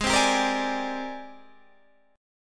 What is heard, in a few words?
Ab Major Sharp 11th Ab down; AbM#11 strummed